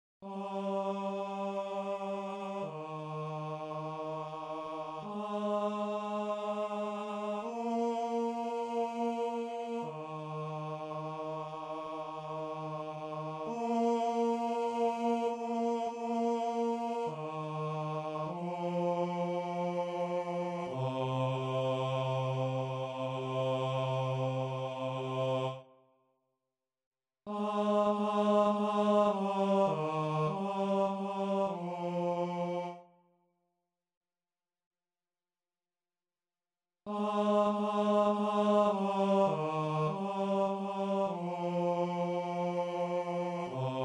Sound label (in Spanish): bajo; o jesu christe